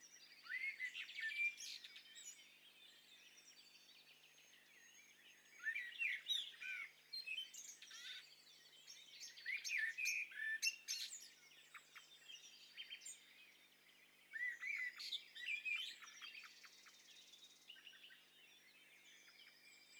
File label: STEREO BIRD COCKTAIL PARTY